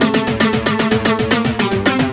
Tweakin, Keyboards

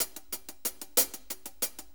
6-8 HiHats 60, HiHat & Ride Loops; Sonic Foundry (Sony Creative Software) - Loops & Samples Drum Tools

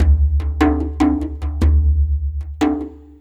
Dumbek Big; Sonic Foundry (Sony Creative Software); Ambient Atmospheres & Rhythms

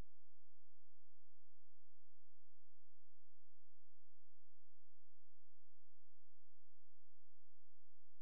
A#, Synclav FM; Big Fish Audio; SPACE1

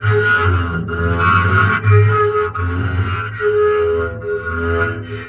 Guitar Process; Sonic Foundry (Sony Creative Software); Loop Noir

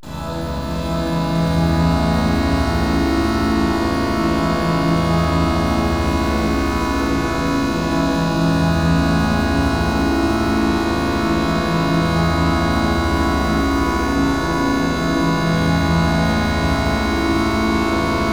ORGANUM

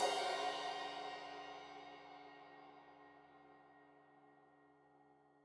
Crash 15in L edge, Cymbals Stereo

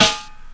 SD, dance drums hip

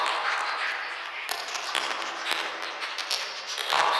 sparse soundscape samples; ReLooped

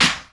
CLAP, Dance Drums

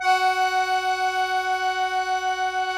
KEY, Cajun Acord; Club-50 - Foundations Roland; Accordians

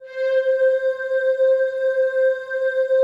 PAD, DIGI-PADS

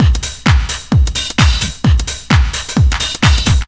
out of breath, Deep House 130bpm

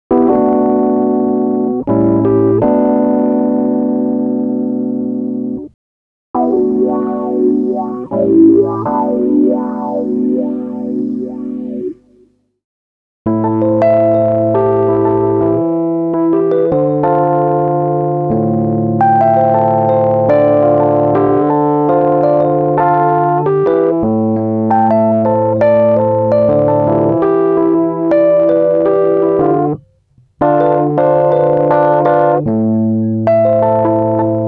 breaks, Jungle; Zero-G Jungle Frenzy 2

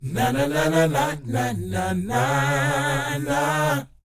NA-NA C#; Spectrasonics Vocal Planet

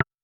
Perc Wood Low, Vermona Mono15 Drums

